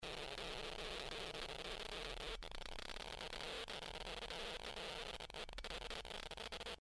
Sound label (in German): Prickeln, Underpressure; Prickle; Holthausen/Düsseldorf; Christoph Collenberg